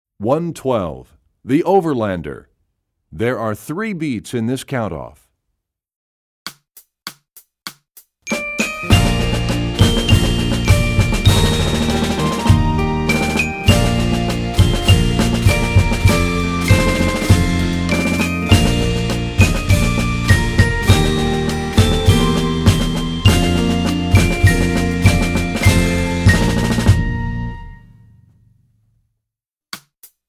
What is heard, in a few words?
Percussion; The Overlander; EE2000 Book2